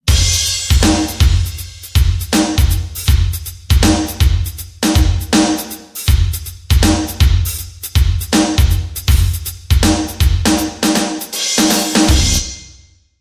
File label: ringtone slow and cool, drumbeat